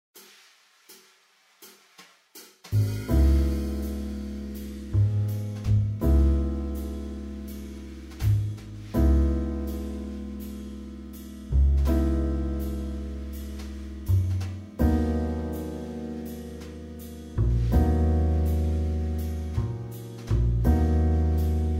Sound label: All Black Keys I No Flute Accompaniment